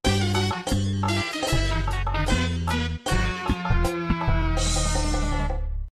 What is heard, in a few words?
Game Over; SM64